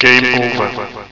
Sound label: game over; Desperadoes